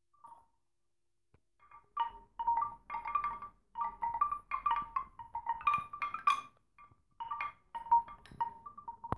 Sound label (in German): Windspiel breit klein